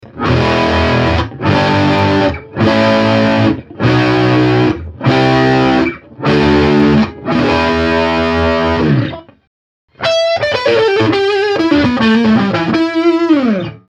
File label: paradox alumin middle drive